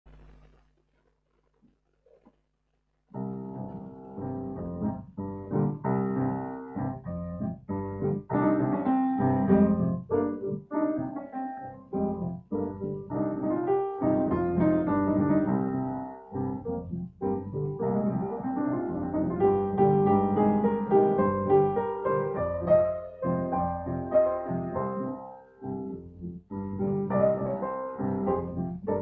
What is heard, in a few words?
piano rec